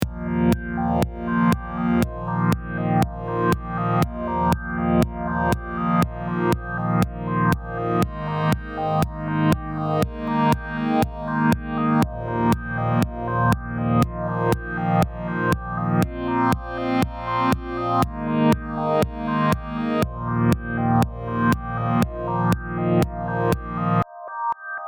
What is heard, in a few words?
12 bar b loops